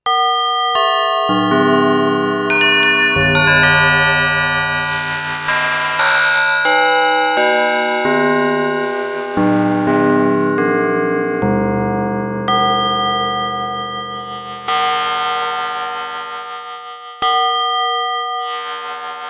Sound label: bells; cloches; idéal pour les signaux métalliques ( cloches, gongs); arturia; origin